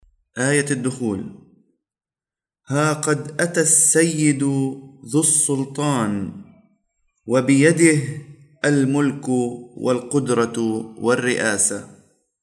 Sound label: Entrance Antiphon; Epiphany of the Lord